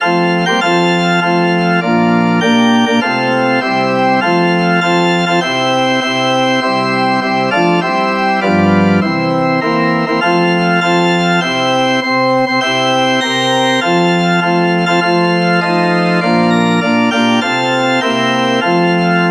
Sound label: organ